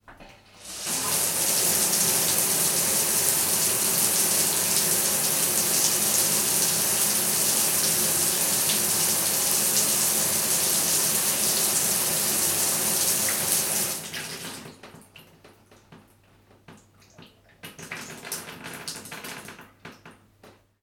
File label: household shower on off; sound effects one